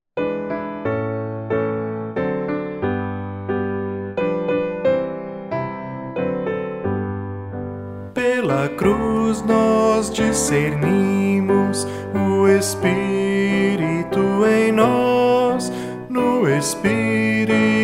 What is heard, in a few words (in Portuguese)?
voz piano